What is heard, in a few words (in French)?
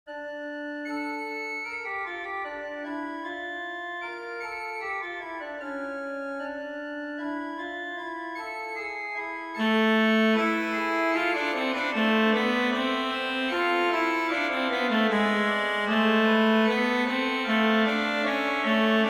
piece facile, organ; Mostoles